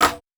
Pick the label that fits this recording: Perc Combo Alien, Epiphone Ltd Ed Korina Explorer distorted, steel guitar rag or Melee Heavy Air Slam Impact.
Perc Combo Alien